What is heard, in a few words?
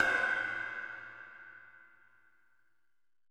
cymbal, Roland MC-909